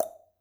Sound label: plop, bubbles